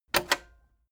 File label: cassette eject click fast heavy